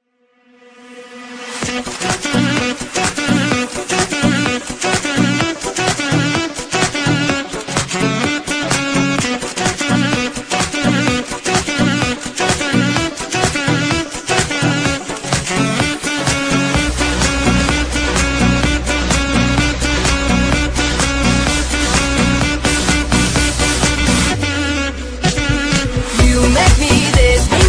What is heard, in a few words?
ringtone